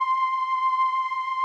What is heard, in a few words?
SOLINA, String Machines; Best Service; XX Large Most Wanted 2